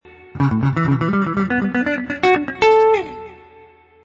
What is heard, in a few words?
mixo, licks